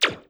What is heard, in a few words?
blaster; endless sky